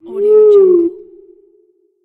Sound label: owl call